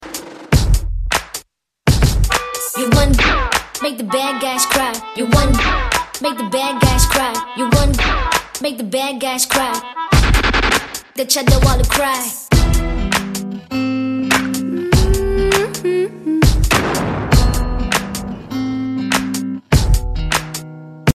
Rap

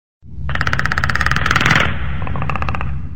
Predator Snarl

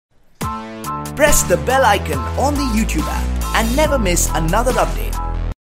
Bell Intro

